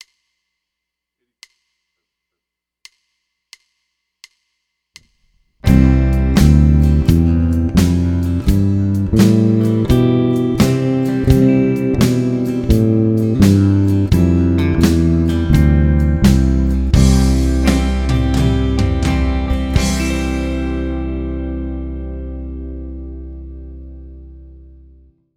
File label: C Major Scale Song; 7-Day-Bass-Challenge